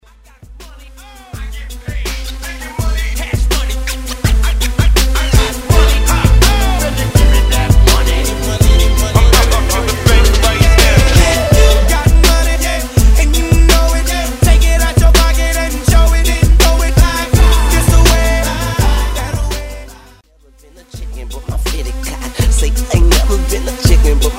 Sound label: Throwback Segue